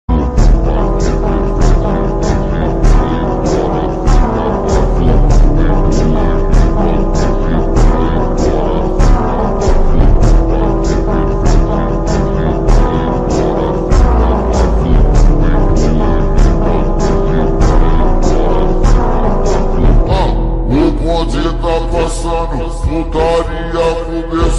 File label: background music